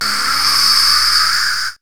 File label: SYN-FX